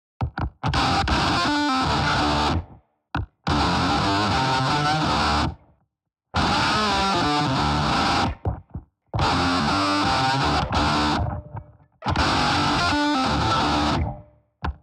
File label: Guitar Riff; Odyssey